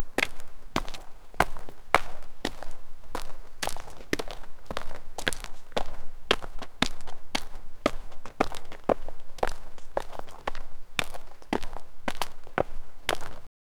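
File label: the sounds of dwarven feet, footsteps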